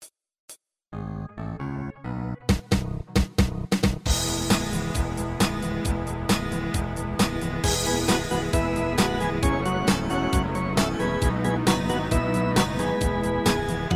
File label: Low Key